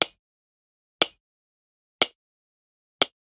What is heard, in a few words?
Generic Button Plastic Small